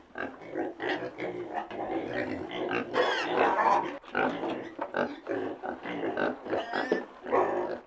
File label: out pigfarm; Mafie 1